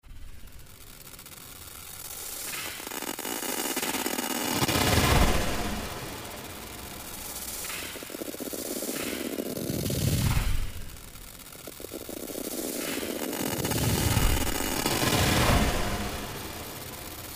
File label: drum stretch